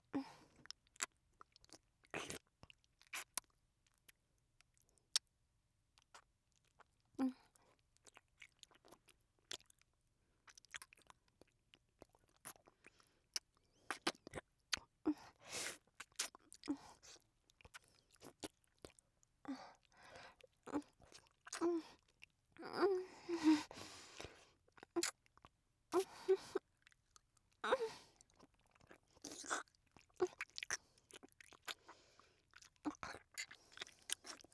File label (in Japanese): キス(弱～中); おまけBGV